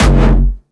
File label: Jumpstyle Kick F#1; Jumpstyle Multis; vengeance essential club sounds-3; VEC3 Bassdrums